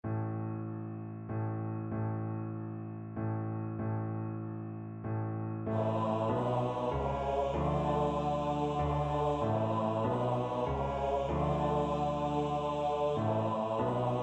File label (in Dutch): Eindelijk komt men 's avonds, alt; 6c.Eindelijk komt men 's avonds